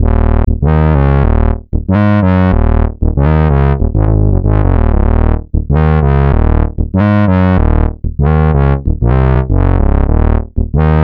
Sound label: Synth loop 95 Ebm, Synth Bass Loops; Slate Digital BASSHOLE Sample Pack